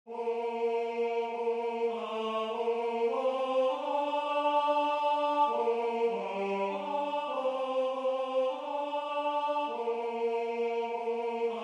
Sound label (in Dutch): Tenor; Een lied tot Jezus Christus